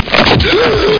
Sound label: KNIF LUNG